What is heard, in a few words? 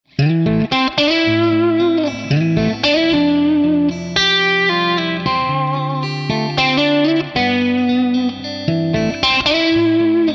Dumble Steel String Singer TS808; Grosh